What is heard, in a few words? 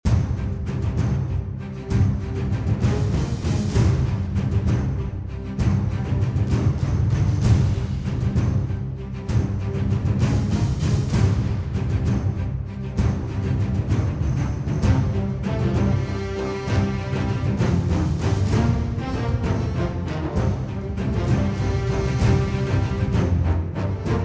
BGM STAGE; Monster Crasher; Android